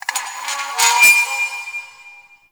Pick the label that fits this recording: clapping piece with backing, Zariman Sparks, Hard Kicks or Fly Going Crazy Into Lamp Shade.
Zariman Sparks